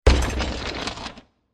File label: Small Crash Impact Blast; Blasts; Impacts; CraftedSignals